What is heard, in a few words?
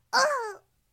uh